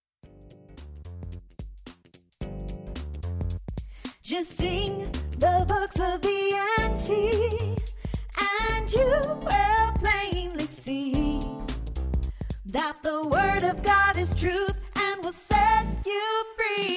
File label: NT Rap